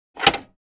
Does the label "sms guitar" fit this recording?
No